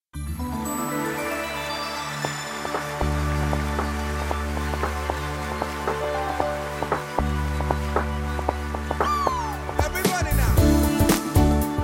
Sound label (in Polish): Hej Witam Was instrumentalna